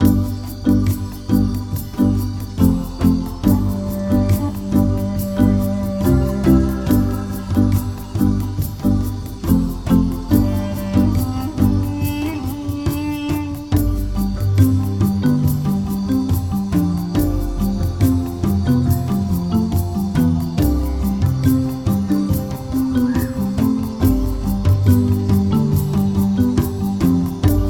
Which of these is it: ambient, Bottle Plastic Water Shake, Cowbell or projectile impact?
ambient